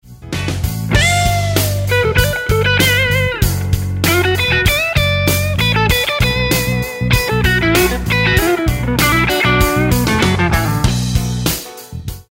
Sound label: Funky Lick, guitar